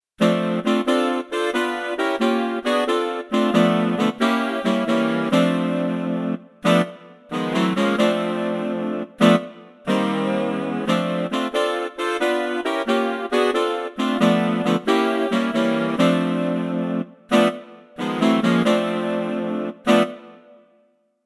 Tenor Section, sax; Montage

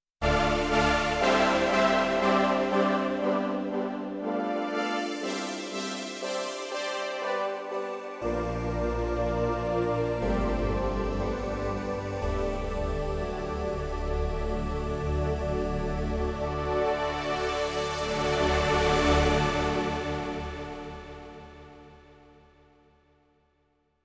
Oceana Pad; montage

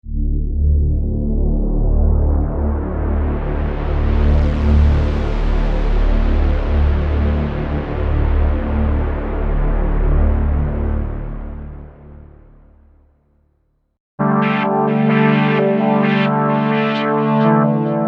Sweeps Fx, phat analog

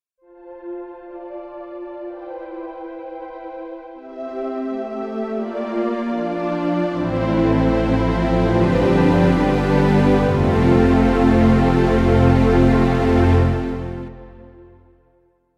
Stringy

